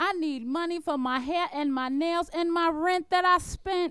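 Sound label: vocal, D, Hip Hop & RnB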